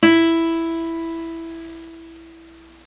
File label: tune 1 e, guitar tuner; Tom Kjeldsen